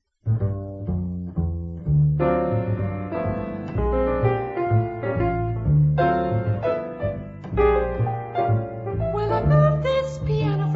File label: Piano